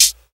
smooth hat one shot e key, hi hat closed sound tuned to the E note